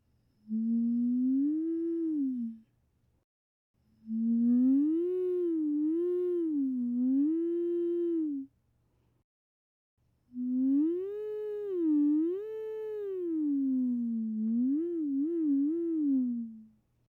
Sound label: Silly Siren